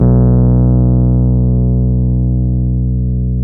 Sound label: PNO, H.Pianet